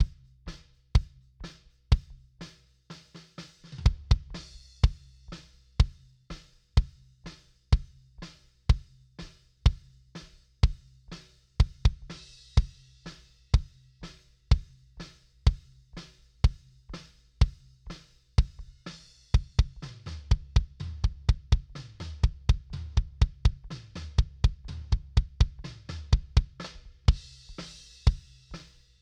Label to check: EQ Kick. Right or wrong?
Right